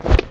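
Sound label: Item Put Armor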